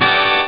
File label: crazy hit; speedball2; Amiga Soundtracker Sample Packs